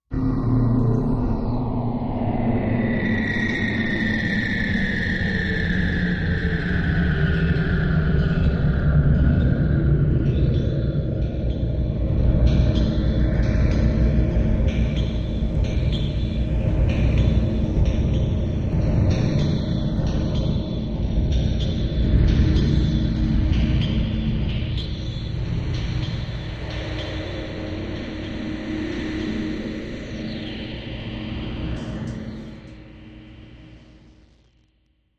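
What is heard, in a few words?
Deep Thought Low Slow, sci-fi ambience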